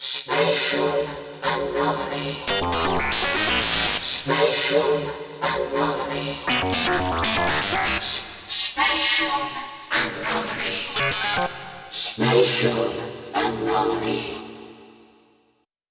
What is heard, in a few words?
Robot Warning, Korg Radias-R